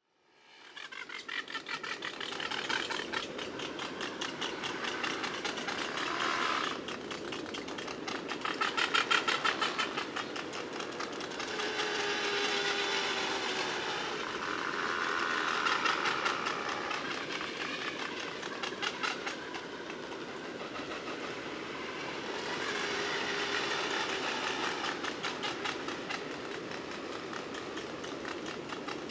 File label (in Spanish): sitio anidacion sula sula; historia natural